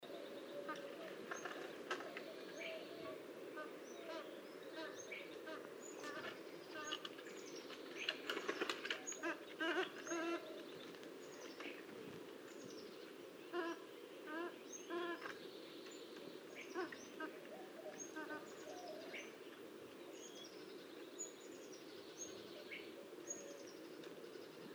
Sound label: Coopers Hawk - Accipiter cooperii; raptors